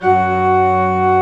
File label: ORGN, CHURCH ORGAN; Roland - LA Composer vol. 1